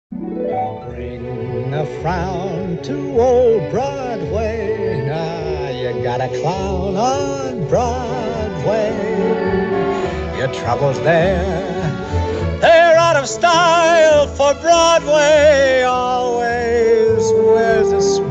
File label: Ballet